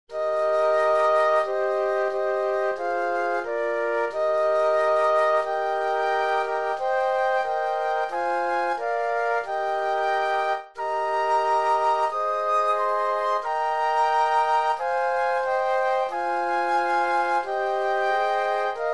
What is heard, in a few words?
Flute Quartet